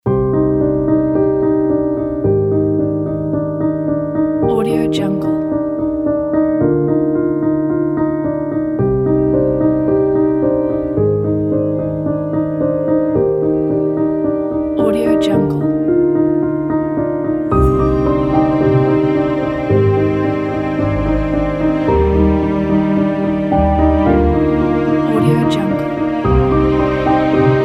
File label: emotive orchestral score piano